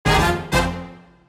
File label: Short Accent, Stingers; iLife Sound Effects